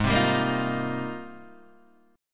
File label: G sharp Major 13th, Guitar, DADGAD